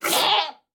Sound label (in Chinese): 山羊尖叫挤奶声